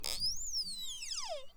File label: call, globi